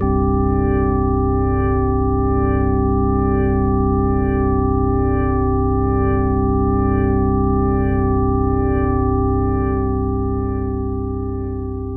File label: PAD Organ; Professor Malbadors